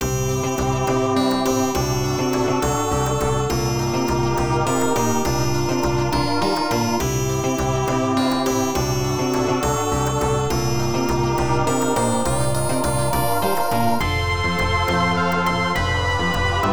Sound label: Loading Screen; Pitapat; X68000